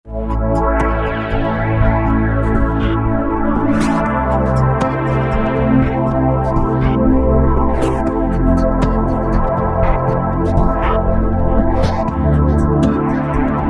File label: Solar Circle, Roland V-Synth